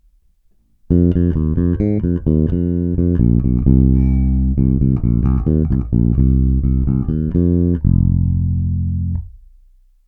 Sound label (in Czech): Snímač u kobylky pasivně, Baskytara; Aleš Vychodil AVBS5